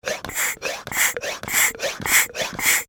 Pesticide Pump, Slow, Dry, Extermination, Insect, Bug; Extermination Sound Effects; BlastWave